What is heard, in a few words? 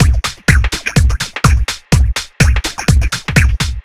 No Clap, Drum Loops 125bpm, Nu Dance Electro; Blip Jam